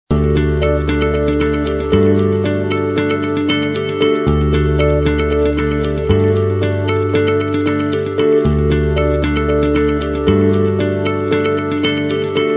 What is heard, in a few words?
Light Loop